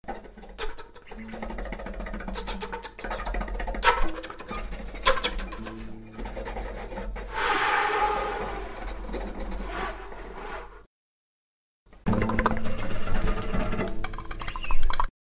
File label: bow tongue key ouija board loop; Explorations